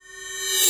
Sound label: Reverse Cymbals